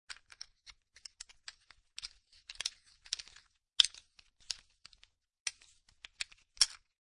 Poker Chips Stacking

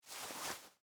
Jeans Hand In, Foley, Clothes, Put, Pocket; Dressing Sound Effects

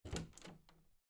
Bedroom Door Open; Household; Blastwave

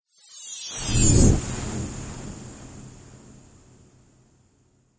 Fantasy Spell, magic sound effect

